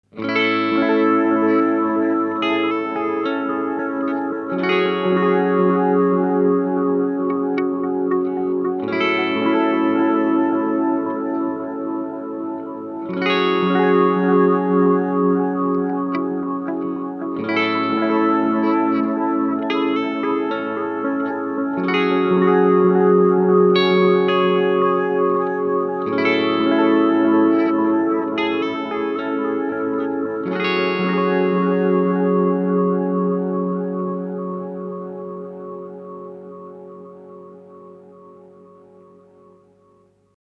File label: Boss DM 101 Dual Mod